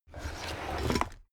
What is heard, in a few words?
drawer cabinet crammed open heavy